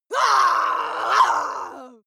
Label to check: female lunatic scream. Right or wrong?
Right